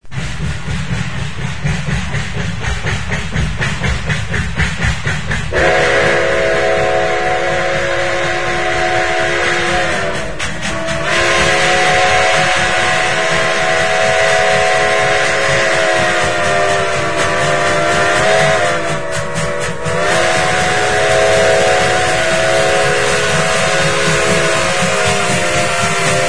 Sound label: 5chime, steam whistles; swanson